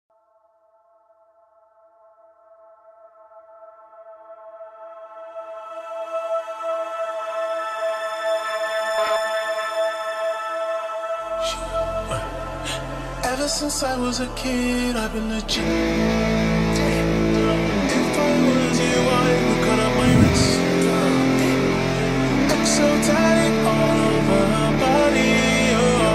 guitar remix; Tik Tok ver.

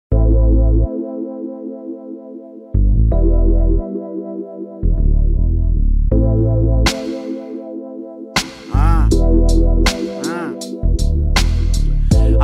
sax

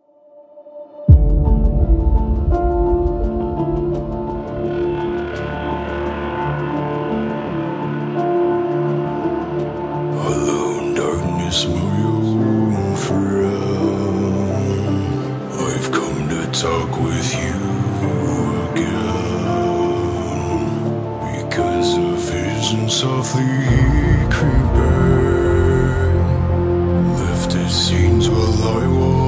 rock cover

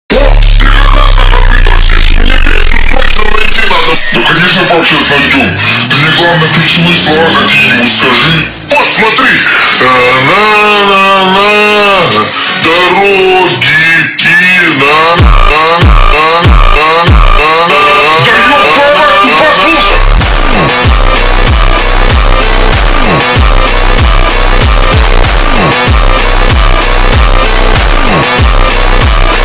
funk ultra slowed

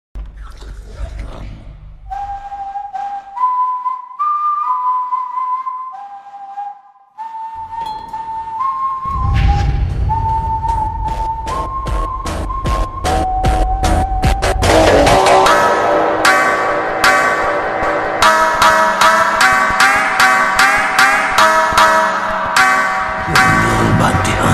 slowed reverb

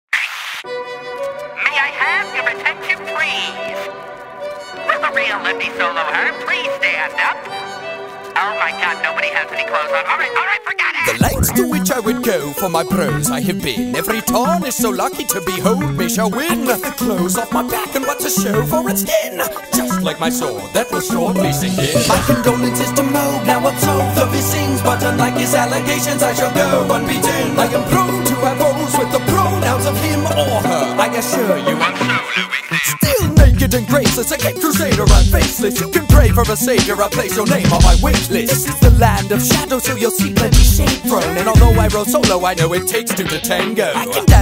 rap; Elden Ring DLC

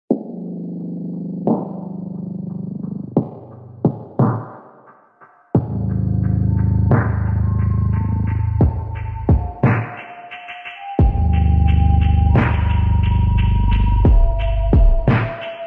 phonk

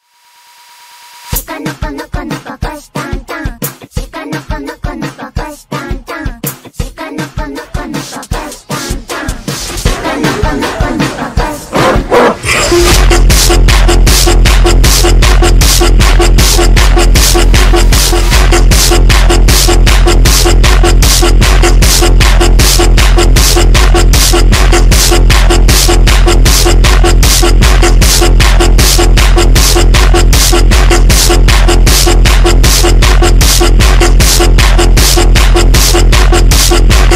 phonk remix

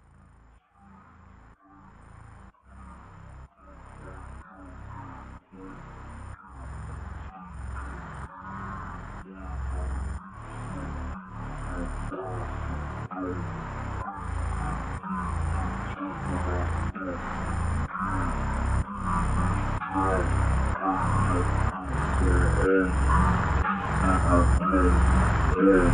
ultra slowed

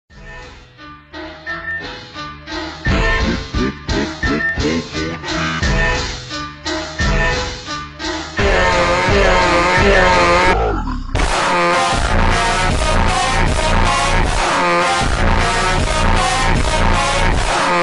beeper funk montagem phonk; roblox

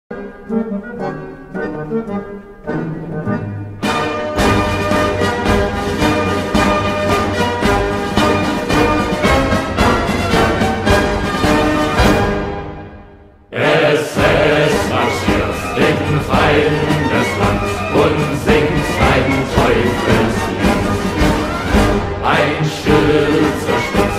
German SS march song

